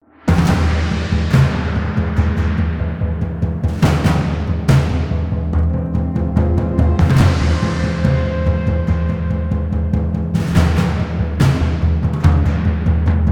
Battle Tam Tam Horns Coda; Real Warfare 2 Northern Crusades

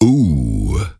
Close Call

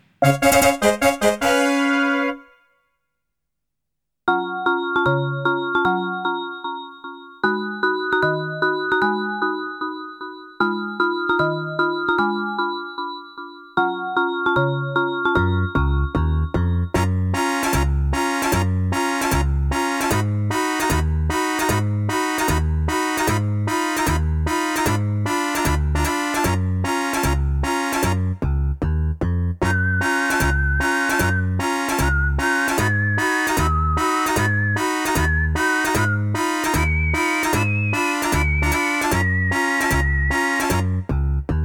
Fanfare - Staff Roll; Namco Video Game Graffiti Volume 6

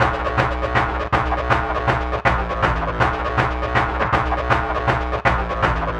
Beats Idle Loop; Anger Foot

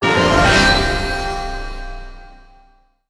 system level up; mobile gamerip 2020; Octopath Traveler: Champions of the Continent

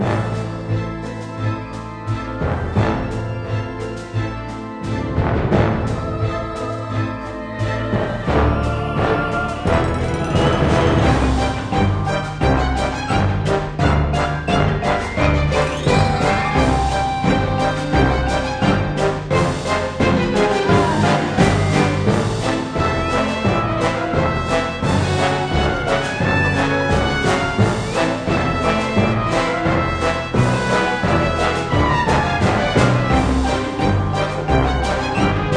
Battle Loop

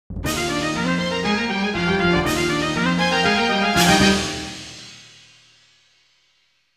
Round Clear; Bubble Symphony

